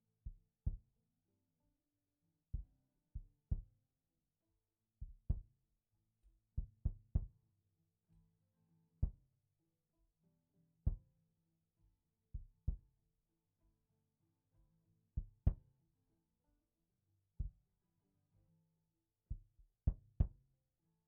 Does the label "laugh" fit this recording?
No